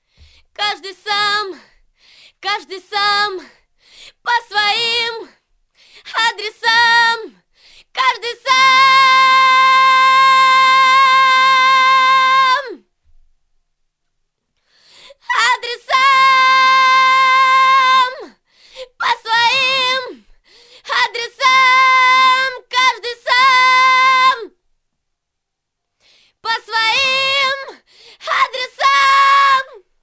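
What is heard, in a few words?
chorus scream, acapellas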